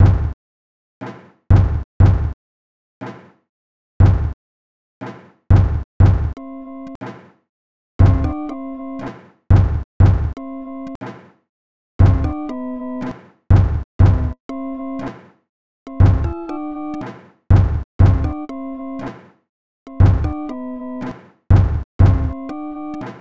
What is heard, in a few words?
Sine Wave